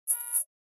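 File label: Flash Beep Electronic Horn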